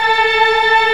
Organ, Big Barton Pipes

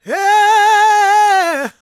GOSP MALE, Vocal Gospel; Vocal Planet Vol1 Gospel

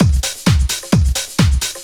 drum 130 trance

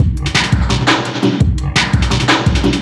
Beat, Drum N Bass

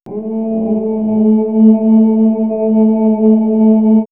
Moany Vox; X-Static Goldmine; backtothe70's

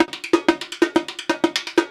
108 Rio Batucada

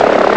chopper, Amiga Soundtracker; Amiga Soundtracker Sample Packs

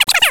Stadium Organ; ESPN National Hockey Night

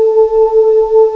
OOHH, HUMANS; M8; CMI Factory

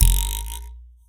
Spoon, Foley; musicradar; cartoon caper

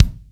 KICK, LA KICK; AKAI S6000 CD-ROM - Volume 3

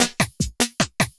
LOOP SD; Ueberschall; Drum & Bass Resonance

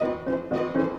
Orchestral Loops; 128 VERTIGO

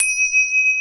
RAMA CYM, TIBETAN BELLS; Crypton - DrumBase5000

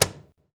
CAJON; Univers Sons - Raricussions

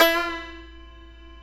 TAR; Roland - LA Composer vol. 3

